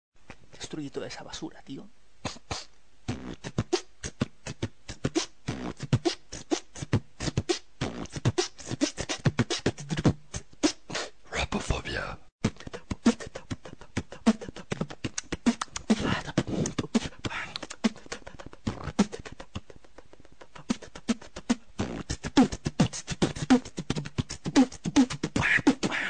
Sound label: drum